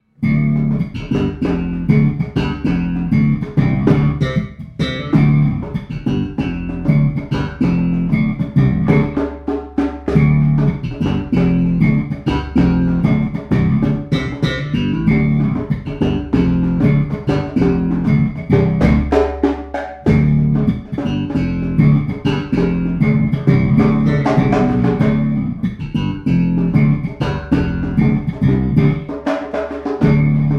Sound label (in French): Basse Cajon